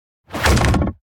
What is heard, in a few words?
Arrow Whoosh Impact Into Wood; Hollywood Edge; sound designer's toolkit v2